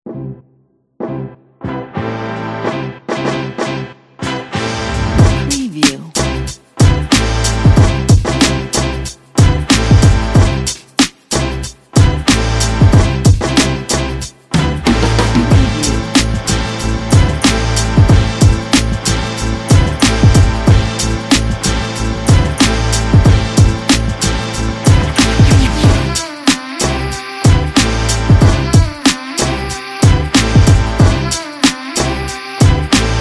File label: boom bap